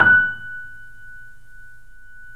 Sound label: F#, 3D Pianos, Boesendorfer; E-MU Producer Series Vol. 5; 3-D Audio Collection